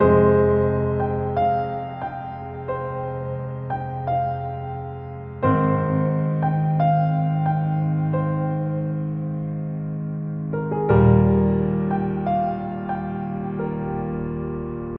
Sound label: Mellow Piano